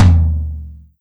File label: Tom Low